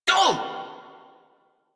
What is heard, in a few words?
Pain, en